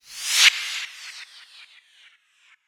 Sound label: Reverse Fx; musicradar